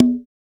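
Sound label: conga open high; CONGAS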